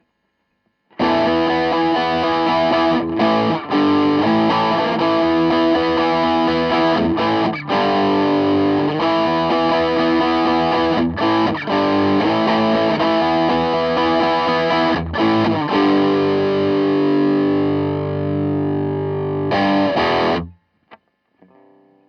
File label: ampeg gvt52-212 crunch; Gitarrenverstärker